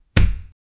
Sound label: impact sound effect